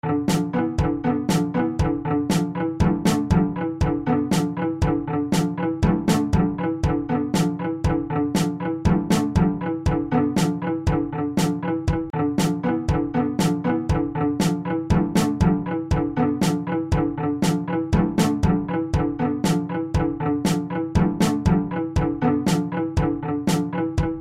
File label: heroic pressure, extended